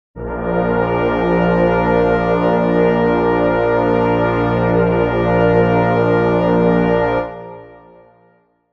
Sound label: brass chord a sharp major